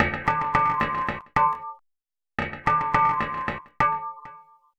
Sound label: Ringing Beat, Metal